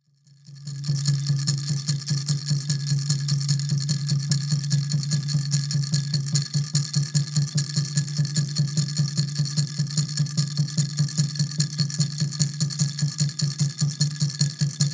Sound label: Native American Church Song